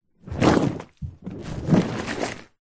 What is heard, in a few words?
household soapy foam bubbles bath water short movement hand; zapsplat